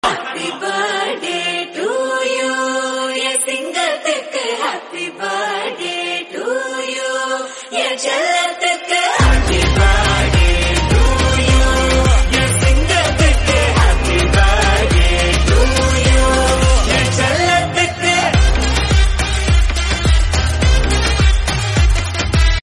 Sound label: Happy Birthday Song Female Version